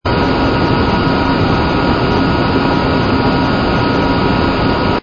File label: engine cruise loop; REM-Freelancer; REM-Mod